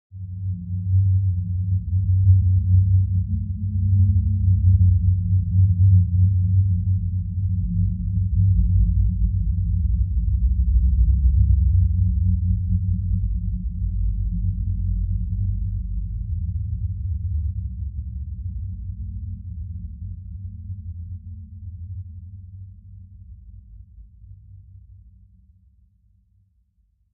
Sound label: Bed Impending Galact